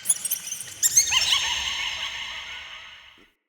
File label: Root Cave Small Critter Squeaks; Ambience; Neathuns Root Cave